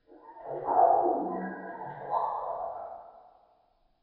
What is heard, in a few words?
bird budgie song; dfcaverns; df ambience